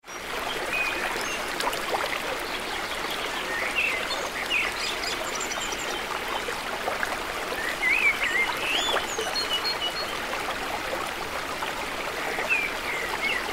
Forest ambience nearby fast river with birds in the trees